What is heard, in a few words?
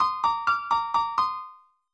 alt rhythm